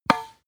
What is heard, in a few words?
tennis ball hit